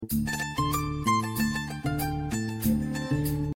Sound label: Msg Tone